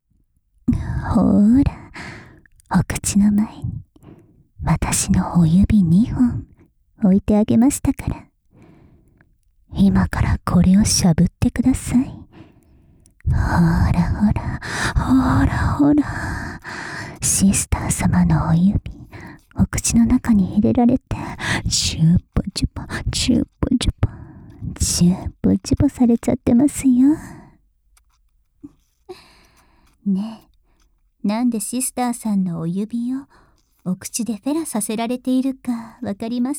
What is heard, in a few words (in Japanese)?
指 フェラ, SE あり; 慚愧の快楽(ケツ穴レイプ懺悔準備&指フェラ)